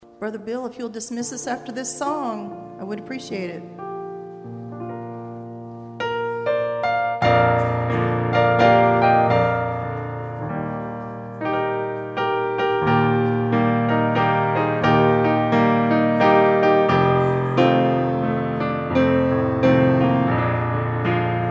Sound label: Closing Hymn